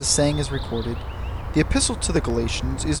noisy

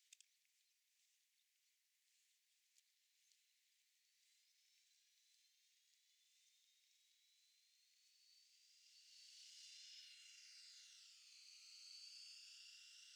DRONES

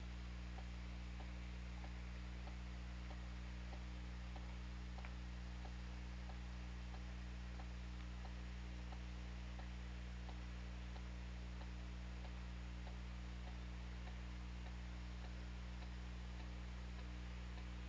Orca; OrcaLab

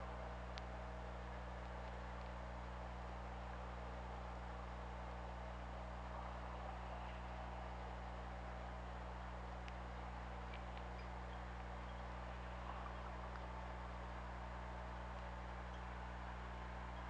Orca; OrcaLab

